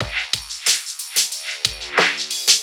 Old School Break, Drums

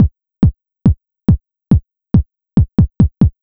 Kick Loop, Drums, Dance Beats

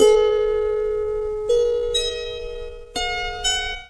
Strings

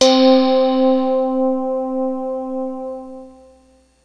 pad; proteus2

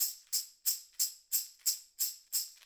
Acoustic Percussion

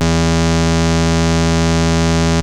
dog bass, yamaha rm1x synth

